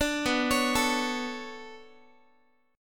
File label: B-Minor 9th B down Guitar; Bm9 arpeggiated